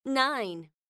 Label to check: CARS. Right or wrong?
Wrong